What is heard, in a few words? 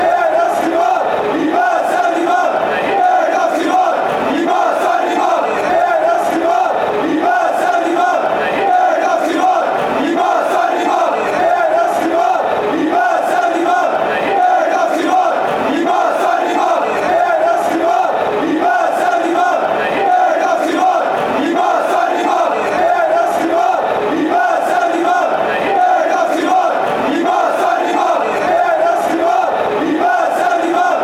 fanchants